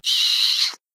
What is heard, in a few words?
kiss